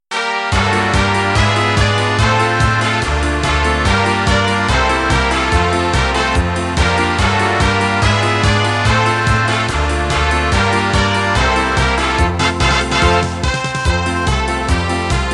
zirkuslieder playback

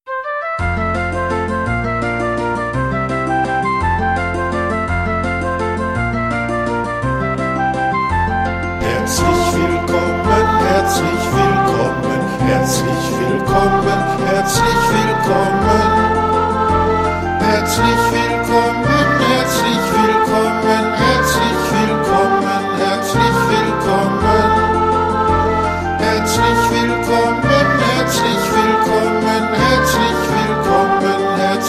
zirkuslieder

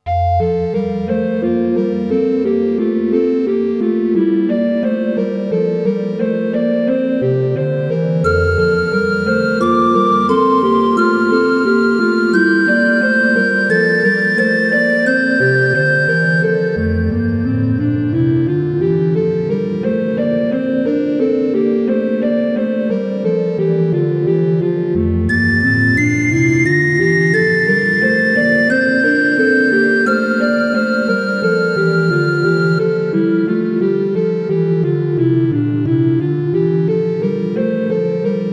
nun danket all und bringet ehr, orgel

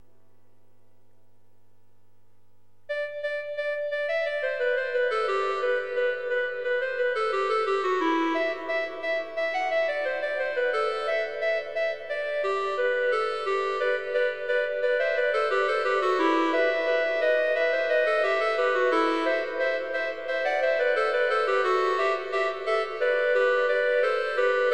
kanon fuer 3 klarinetten partitur

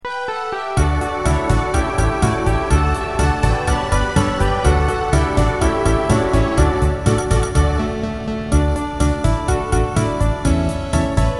kinderlieder playback

